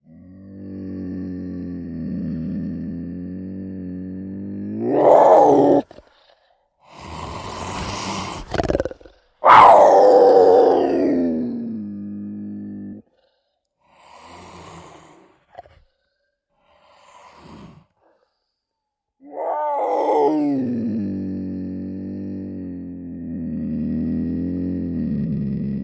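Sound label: puma golos, puma voice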